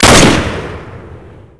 m14 fire; firearms

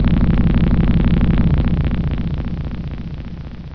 DOOR; svencoop; sonic

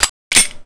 slide pull, weapons

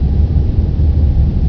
loop rumble; gearbox; klingon

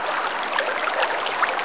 bubbly, ambience

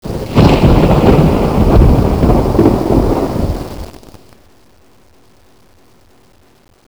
thunder clear; amckern

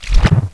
claw strike; svencoop; cryocell

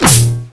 Boot Jmp

